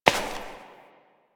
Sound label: Step Ice; Warframe; Koumei